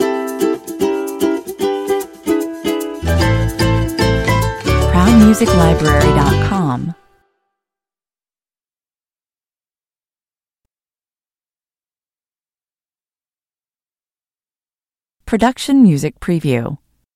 ukulele joy stinger

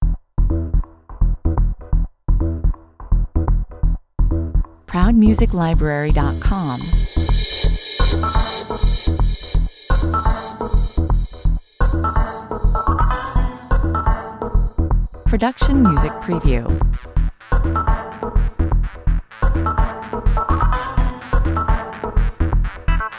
cherry whipp cream (bed) 126 bpm

